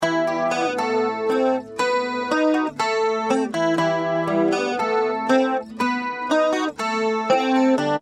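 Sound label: acoustic guitar cs505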